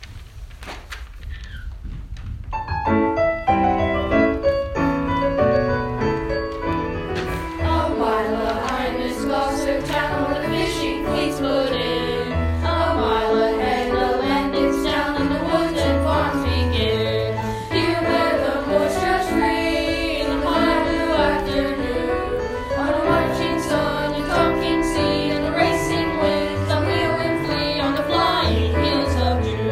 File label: with vocals; gloucester moors